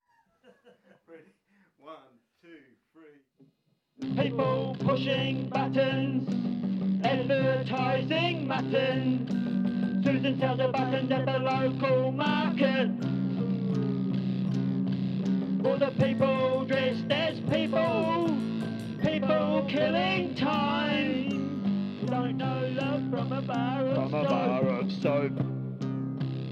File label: People eating mutton; Meat for the neighbours dog